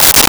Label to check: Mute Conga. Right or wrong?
Right